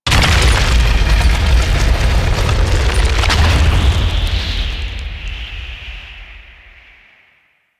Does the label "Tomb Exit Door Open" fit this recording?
Yes